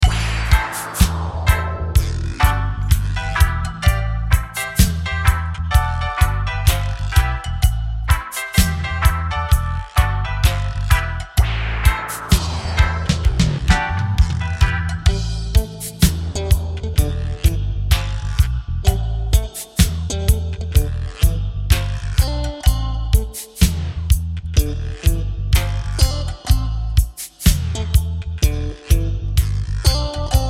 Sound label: Dub